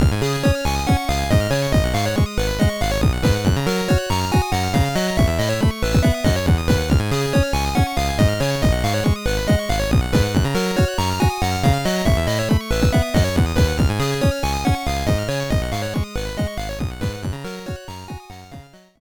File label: BGM, gba; card e reader 2001